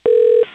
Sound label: ring